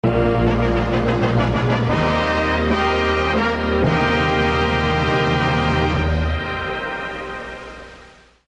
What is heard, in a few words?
Fanfare; KMPC